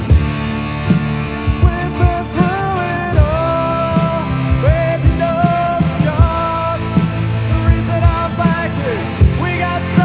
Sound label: chorus